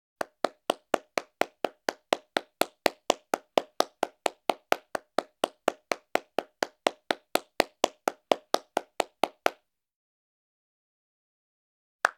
Human, Clap Solo Clapping Slow, Applause Solo Clapping Fast, Applause